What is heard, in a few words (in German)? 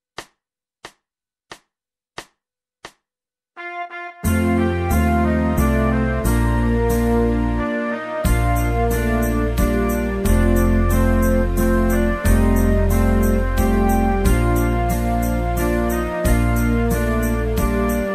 Schulband Volkslied; Musizierklassen; Die Tiroler; violoncello